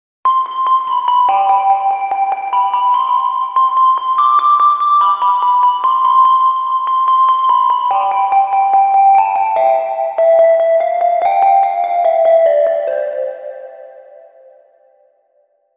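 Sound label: melody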